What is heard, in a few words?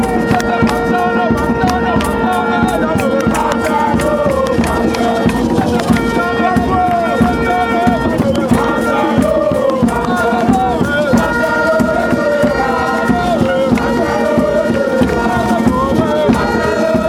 ghana chant